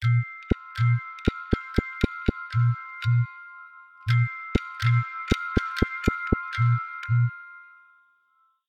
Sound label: Icicles, alarms